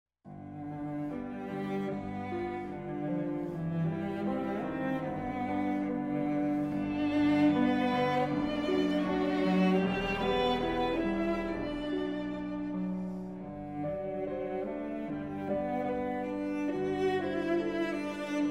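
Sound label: piano trio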